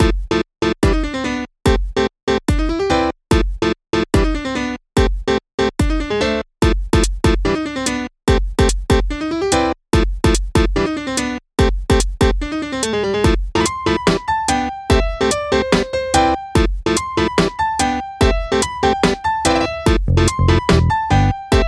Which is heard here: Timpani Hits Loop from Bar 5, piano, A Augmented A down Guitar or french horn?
piano